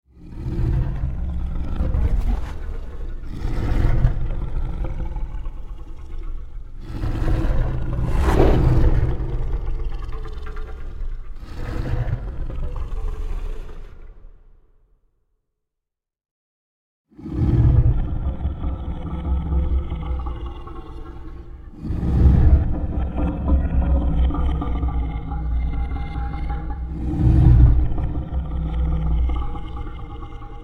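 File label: scary sounds monster roar; strashnye-zvuki-ryk-monstra; Minecraft; Votive 1.21.1; Votive Content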